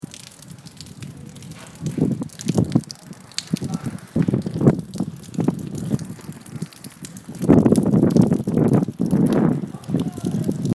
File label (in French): Goutte Eau